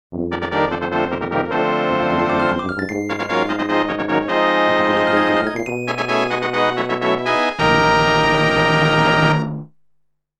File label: boss clear fanfare; a link to the past